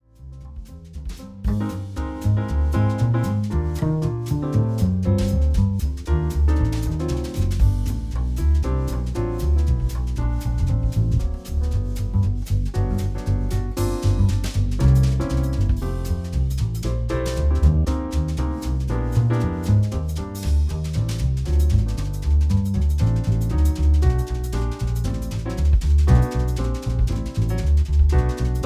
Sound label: jazz trio